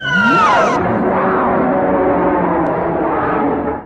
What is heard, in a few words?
Biollante Roar; Heisei